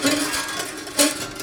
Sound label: Prepared Piano; Battery 3 Sample Kits